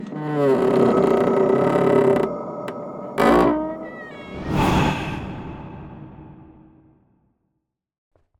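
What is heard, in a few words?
otkrytie dveri, door opening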